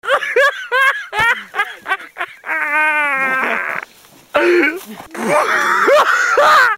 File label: haha